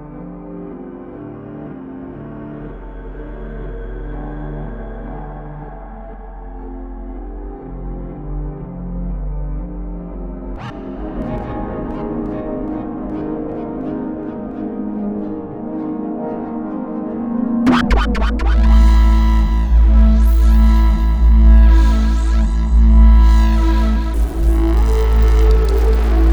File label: synth wail; speedcomp